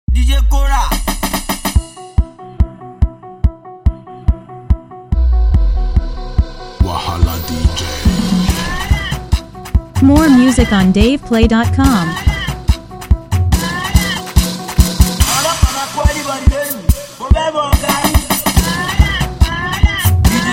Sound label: Beat